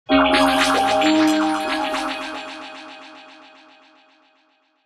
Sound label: melodic fx digital doodad Ab Maj; base pack